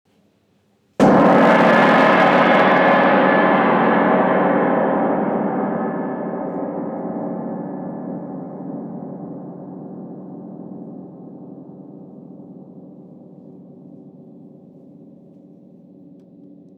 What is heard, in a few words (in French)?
grand 1coup forte, GONG VIBRATION